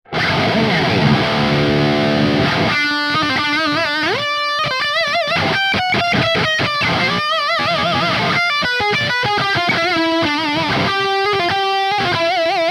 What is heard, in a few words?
lead line